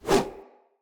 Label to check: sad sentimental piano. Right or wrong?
Wrong